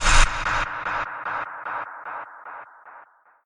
Startup; Five Nights at Candy's Remastered

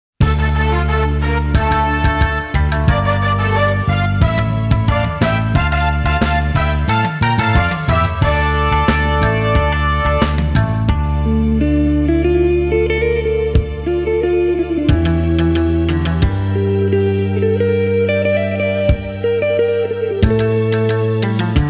Guitar Instrumental; mega man rockman battle chase; GUTSMAN Ending

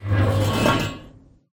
manhole metal slide door open close

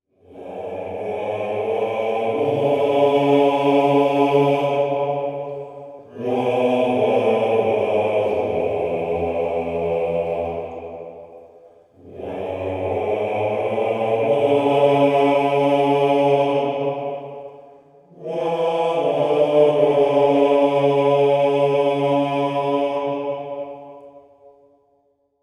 Town Bell; minecraft dungeons

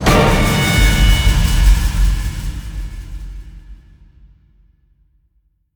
Stingers; Outlast 2; PS4 Windows Xbox One

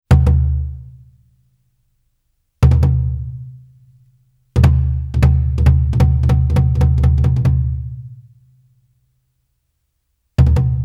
Drum Roll II

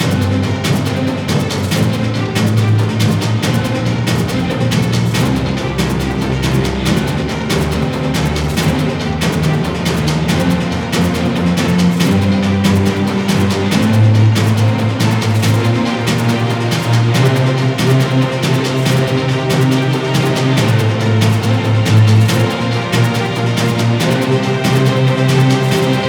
Starting Segment; Valley

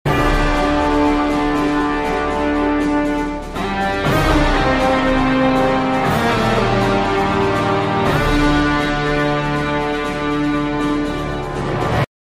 Win

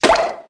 Wand Poof; Lego My Style Kindergarden macOS Windows 2000

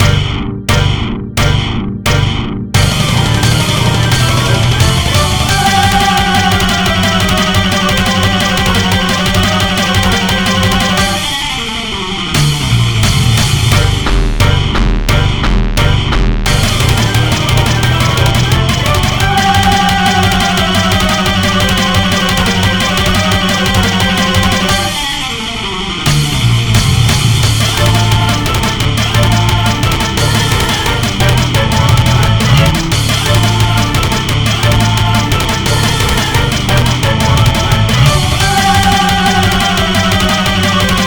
huge robot music; horace ps4 switch windows xbox one gamerip 2019

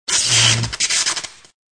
Thunder Shock; Tails Nightmare 3 Demo

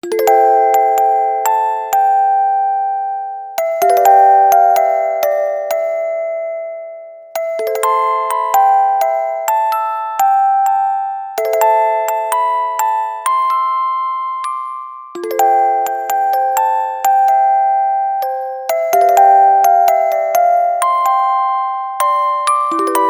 Amber World Orgel ver; Cotton; Nagisano

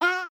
death Sharp

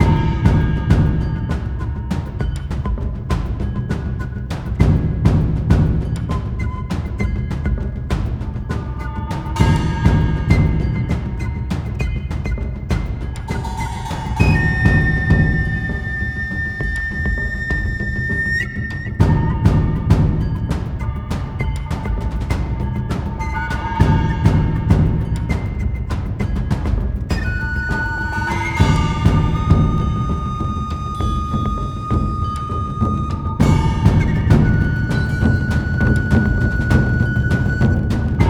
Music Boss (Boss Fight Intensity=Medium); Music Boss Hideout1; BossFight Phase=Phase2

